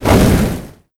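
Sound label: fire blast; kult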